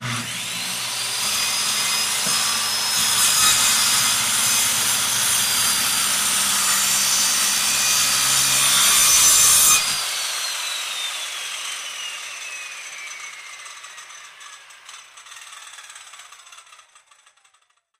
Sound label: CIRCULAR SAW CUT, Electric Circular Saw: On, Cut Wood, Off. Mono; saws